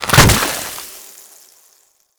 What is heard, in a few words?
Infested Juggernaut Venom Cloud Launch; Warframe; Attacks